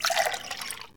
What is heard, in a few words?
get drinks; SpongeBob Diner Dash